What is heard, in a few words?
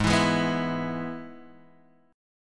Minor Sharp 5th, Guitar, Open G; Gsharp; x,1,2,1,0,2